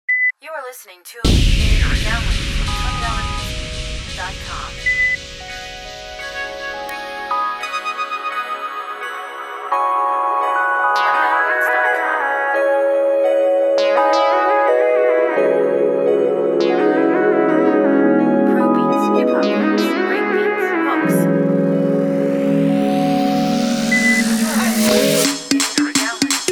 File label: DubStep, beats